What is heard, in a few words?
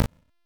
Exalted Sniper Shot; Warframe; Frumentarius